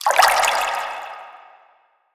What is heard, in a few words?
Random Water Splash